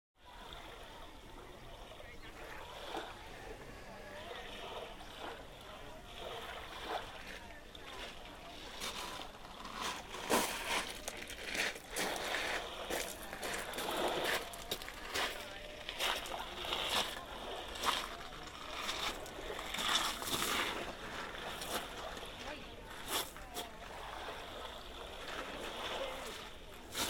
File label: gentle waves - walking- throwing; whitstable